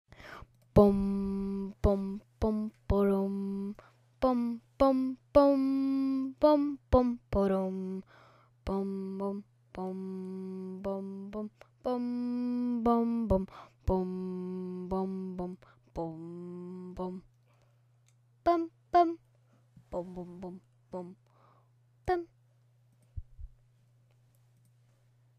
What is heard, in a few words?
theme song; pie island